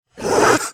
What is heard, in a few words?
Spirit Mists Fast Attack; Blind Quest - The Frost Demon